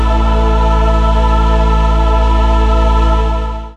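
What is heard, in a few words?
synthesizer Dance; Best Service Gigapack 1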